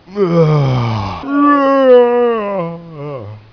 Yawn

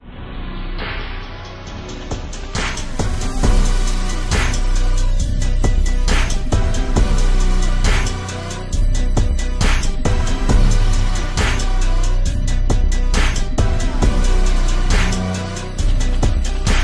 beat

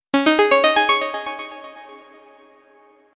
Message arpeggio

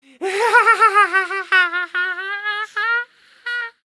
Silly Giggle

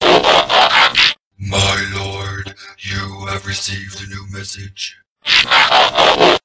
Soundwave Txt Notify

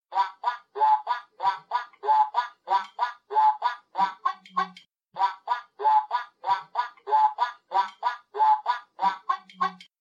Duckie sounds

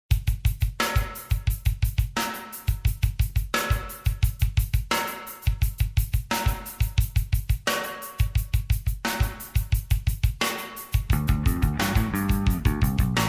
Bass Intro

